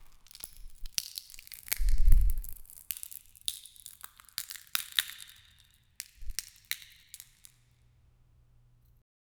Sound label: egg shell cracking with a; revealing